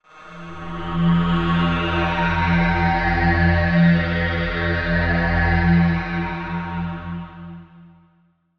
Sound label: Pad; Routed Sound; Serum 2 Expansion - Quantum Enforcer Vol.1